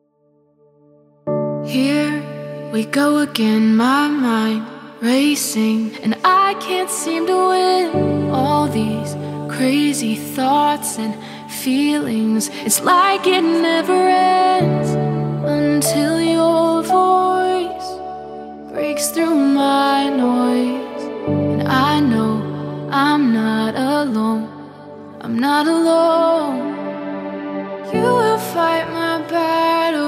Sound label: acoustic